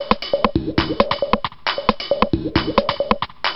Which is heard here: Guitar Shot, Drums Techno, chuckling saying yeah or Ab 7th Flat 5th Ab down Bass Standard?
Drums Techno